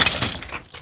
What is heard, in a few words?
skeleton death; minecraft